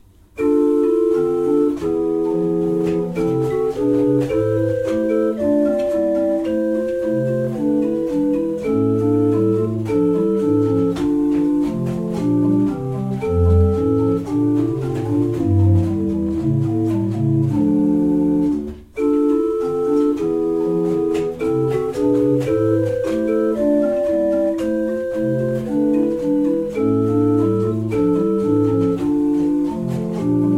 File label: Gutschenritter, pipe organ